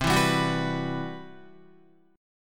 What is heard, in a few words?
C Major Sharp 11th, Guitar, CM#11 chord; CM#11 strummed; JGuitar